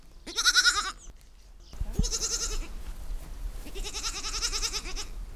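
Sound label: Capra domestica juvenile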